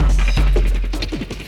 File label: LOOP, Drum & Bass, 160 BPM